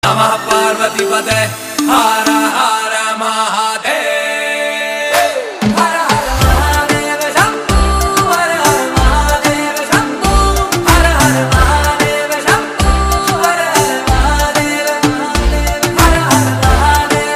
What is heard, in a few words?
OM NAMAH PARVATI PATAYE RINGTONE